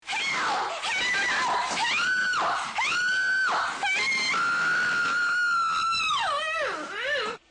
scream gagged

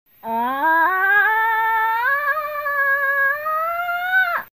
ahh

